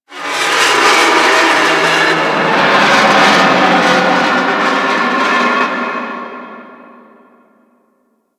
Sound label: Ocean Long Metal Creak; Warframe; Grineer Ocean